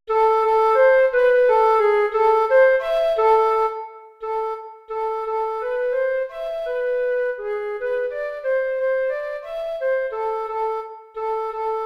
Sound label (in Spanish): duo para flautas; virgen caminando